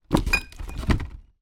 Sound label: foley glass bottle place onto box bang on other